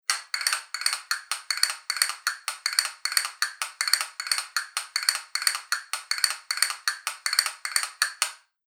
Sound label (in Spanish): castañuelas madera gris marron veteada